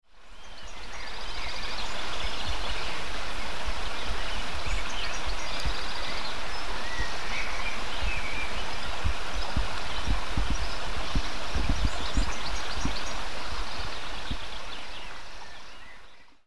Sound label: deck Sounds